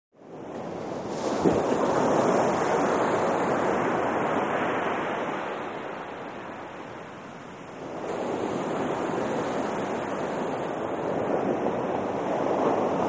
Waves 1, Medium Size Waves Crash with Lots Of Foam Hiss.